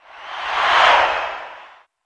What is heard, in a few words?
Football Crowd Dopp, sports arena football